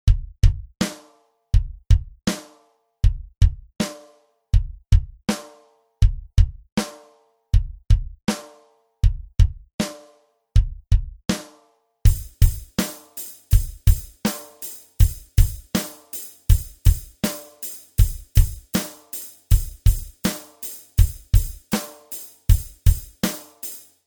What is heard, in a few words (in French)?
batterie; Rythmique 3; Constantes et modifications dans la musique jazz